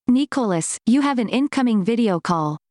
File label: video call